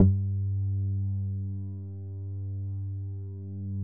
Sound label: Long Synt Bass Sample